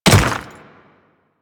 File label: Royal Guard Dirt Step, Footsteps; Warframe; Grineer Royal Guard